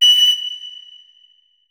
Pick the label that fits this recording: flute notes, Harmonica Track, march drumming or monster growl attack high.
flute notes